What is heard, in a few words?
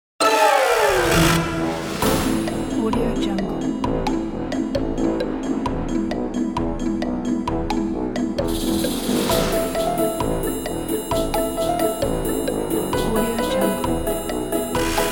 clown chase